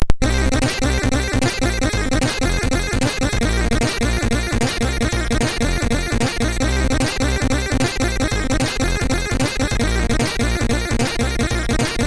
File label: MOS6581R3